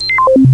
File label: TUNEOUT